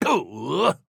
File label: AHurt